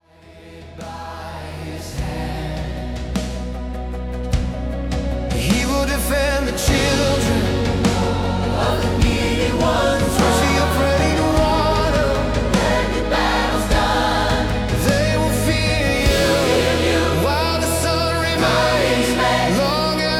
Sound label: Pop Style; Psalm 72 Reign of Righteousness; latin pop style